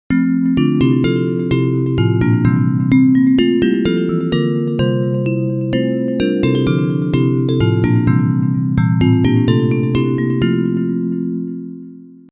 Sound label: Bells